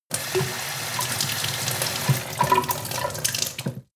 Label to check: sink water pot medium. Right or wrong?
Right